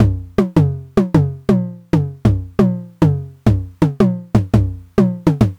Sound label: 909 Tom Hi